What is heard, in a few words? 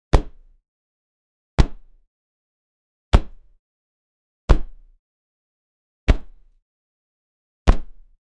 pillow